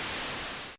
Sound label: Sailing; Trade-Winds